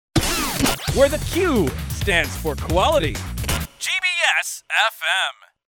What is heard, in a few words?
jingle